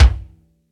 puffy top end kick single hit b key, kick drum single hit tuned to the B note